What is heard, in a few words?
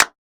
Small Clap; Drums; One Shots; Claps; Cymatics; EDM Starter Pack